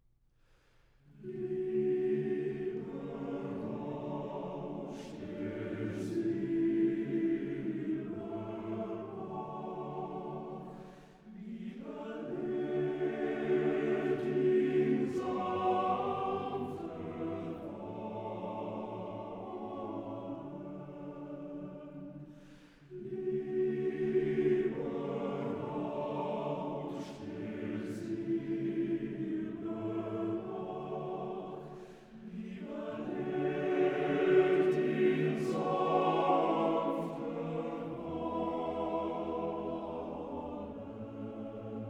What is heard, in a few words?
quartet for unaccompanied male voices; Liebe ("Liebe rauscht der Silberbach"); D. 983a; Schubert; Songs for Male Chorus